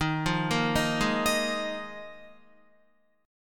D sharp Suspended 2nd Suspended 4th D sharp Guitar; arpeggiated; JGuitar